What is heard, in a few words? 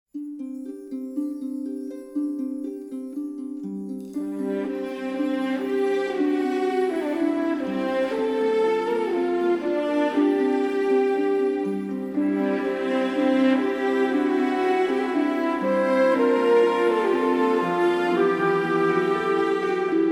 Orchestral